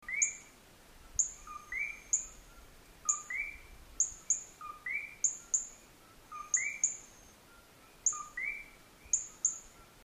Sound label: eleutherodactylus gryllus el yunque national forest puerto rico; Its call is a thin chirping; hence the epithet "gryllus": "cricket"; montane rain and cloud forests; West Indian